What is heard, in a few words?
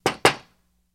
Knocks On Glass Light